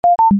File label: unlock